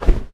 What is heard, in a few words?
bird flap